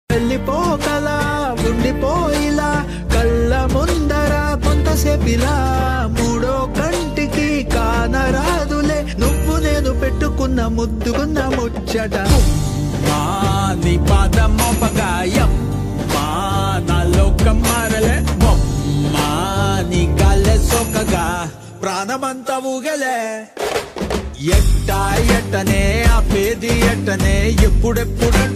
marriage band ringtone; ambajipeta

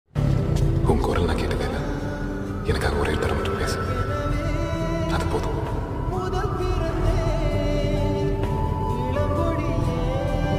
painful one side love song

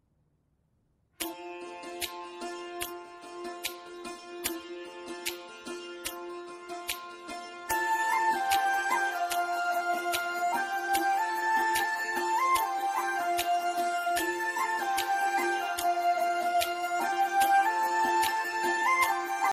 instrumental ringtone; salman khan

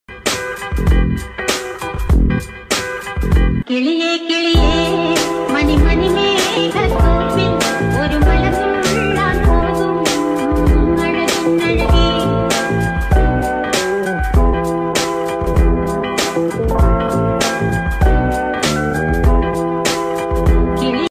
remix ringtone tamil bgm

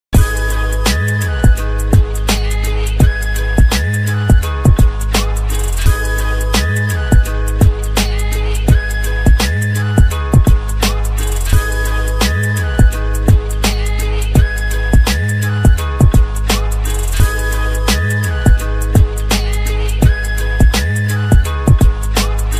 instrumental ringtone new punjabi song

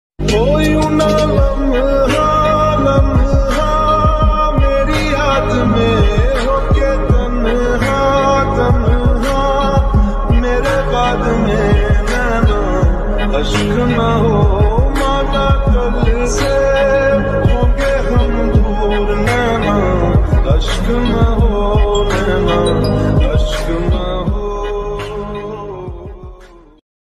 sad ringtone; broken heart; mood off; call sms phone message ringtone; brekap

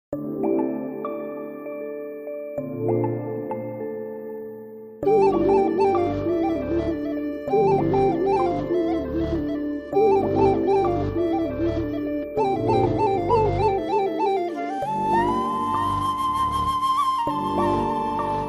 flute bgm telugu feel good music; premalu

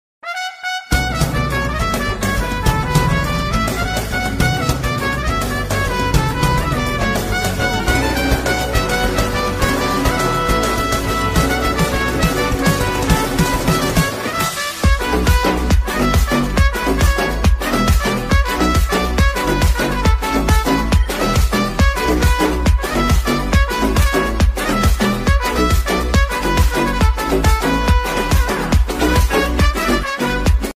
song bgm ringtone; aavesham

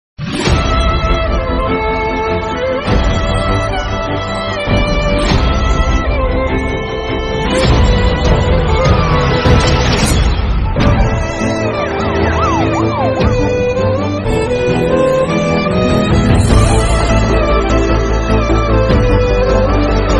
bgm ringtone dog emotional; charlie 777; dog lover

